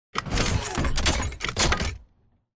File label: alert idle to walk; Titanfall 2